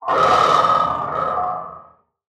FRACTAL GUARDIAN death; Legacy

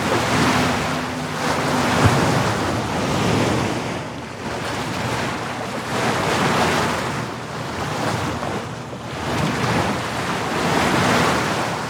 Splash Loop, Water

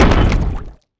Damage alien Ruins Wall

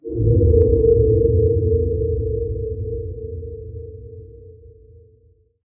Ambient

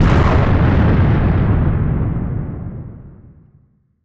Explosion Medium, Barotrauma Weapons

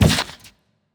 spike Hit; Thalamus; CARRIER

